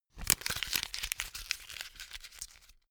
notepad sheet crumple fast

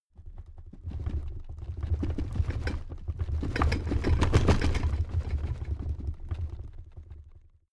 drawer cabinet crammed rattle

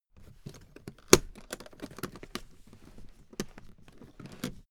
suitcase handling rush

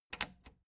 plastic button latch loose click enable slow

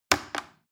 plastic button little click heavy slow

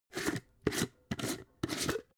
jar glass plastic open slow

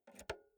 insert jack multi socket

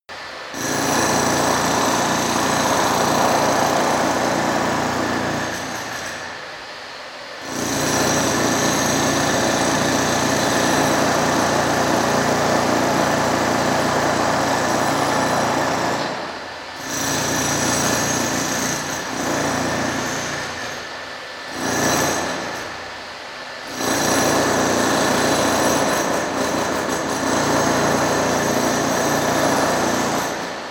construction site stone drill

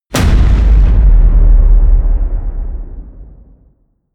air strike

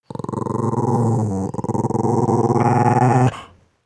dog small growl wavy

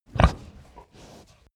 pig grunt breath

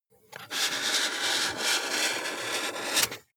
marker mark soft long

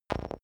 sci-fi electronic damaged movement heavy

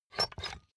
puncher squeak punch

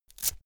velcro open cap fast

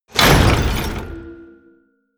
mechanical lever metal large down

